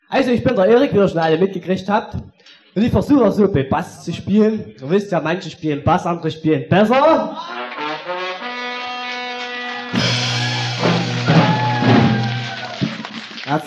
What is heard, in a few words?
bass gag, live in concert; am 24. Februar 1996 in der Aula des Thalheimer Gymn; Manche spielen Bass, ...